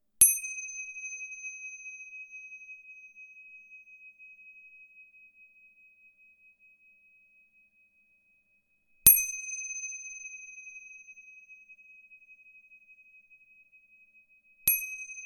tingsha

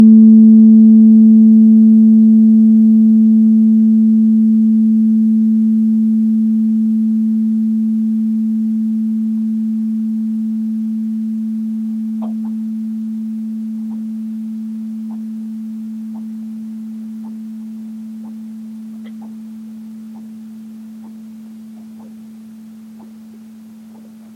lungs, tuning forks, human organ weighted

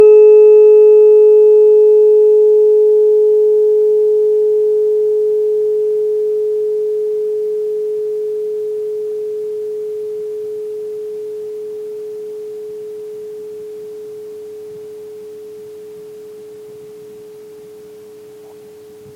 fire, tuning forks five element

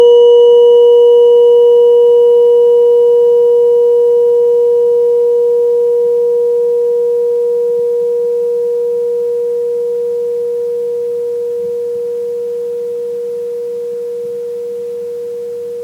pericardia, tuning forks, meridian

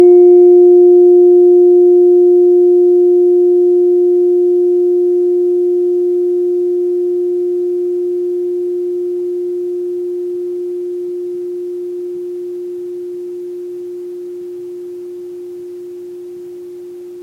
wood, tuning forks five element

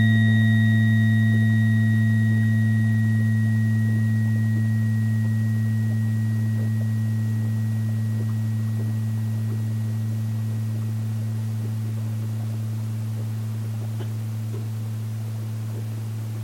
stomach, tuning forks, human organ weighted

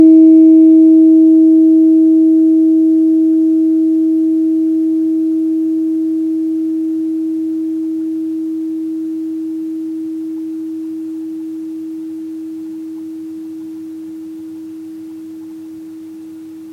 calcium, tuning forks, minerals

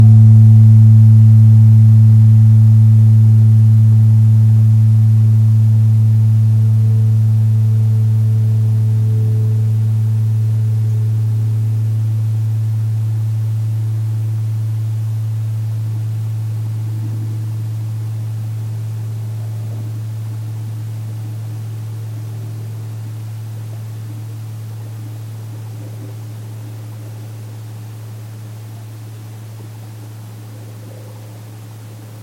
stomach, tuning forks, human organ weighted

